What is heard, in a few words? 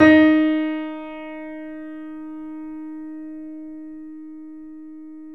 D#, Boesendorfer, 3D Pianos; E-MU Producer Series Vol. 5; 3-D Audio Collection